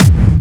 kicks

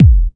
kik, Bass Drum Kicks

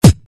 kick

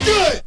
Vinyl

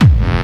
Kick n Bass

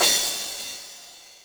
CYMBAL, Yamaha RM 50

